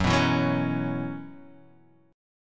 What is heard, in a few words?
F sharp 7th Suspended 4th, Guitar, Half Step Down